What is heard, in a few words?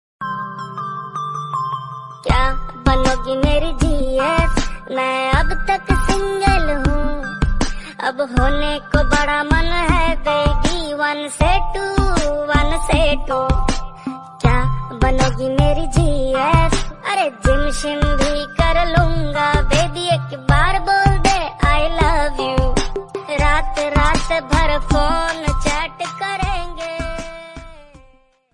Tamil Song Ringtone; Shinchan